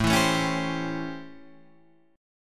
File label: A 7th Sharp 9th, Guitar, Open D